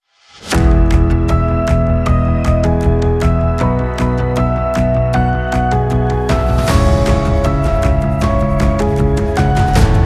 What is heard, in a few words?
music background